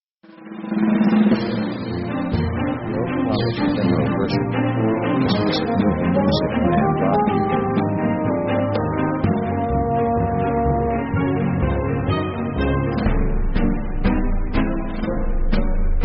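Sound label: orchestra quirky comedy march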